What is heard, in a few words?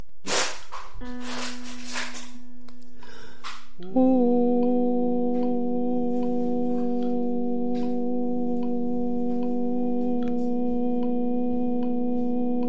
TENOR complet, chorale